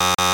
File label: buzzer